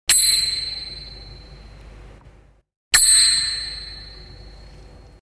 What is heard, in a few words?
Cymb